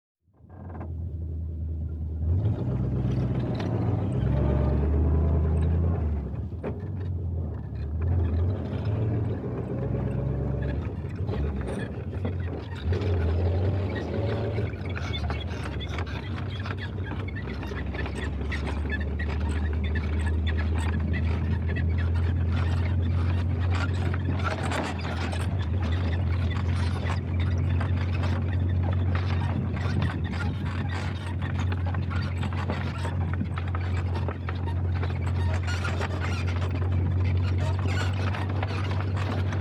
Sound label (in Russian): Staryi gruzovik